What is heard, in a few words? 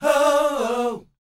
SHOO B E, R&B Dance, vocal; Spectrasonics Vocal Planet